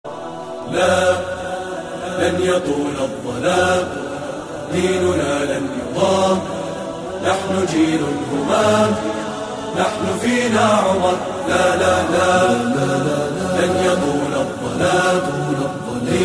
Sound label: Beautiful Islamic Ringtone